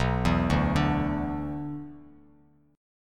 B-Minor Double Flat 5th B down, Bass Standard

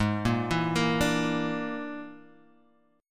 Bb 7th Flat 5th Ab

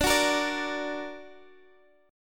D Augmented, D, down, Guitar DADGAD

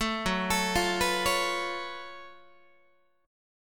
F sharp Minor Major 11th, Guitar; arpeggiated; JGuitar

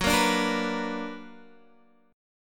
B Minor Major 7th

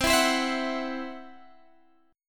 C Major Flat 5th C down Guitar DADGAD

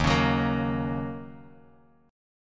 Eb Minor 7th, down, Guitar, Drop D; strummed

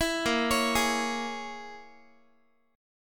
A sharp Minor 7th Flat 5th, Guitar; 19,18,16,18,x,x; 4A,4E,5A,5D,5G#,6C#; arpeggiated

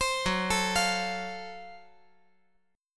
Gb Minor Major 7th Flat 5th, chord

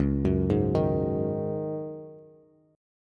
Db Suspended 2nd Suspended 4th, Bass; arpeggiated

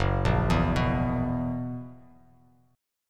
Gb 11th, Bass Standard; Gb11 arpeggiated; JGuitar

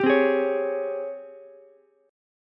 Ab Major 11th Ab Ukulele C6; AbM11 strummed; JGuitar

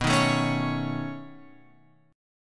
B 9th B; B9 Chord {7 6 7 6 X 9} strummed

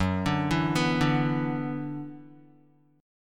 F sharp 7th Suspended 4th Sharp 5th, Guitar

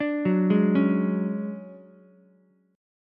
D Major 7th Flat 5th D down Ukulele; arpeggiated; JGuitar

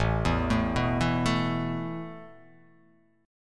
A Suspended 2nd, guitar chord; Asus2 arpeggiated

